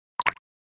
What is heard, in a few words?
Pop up bubble notification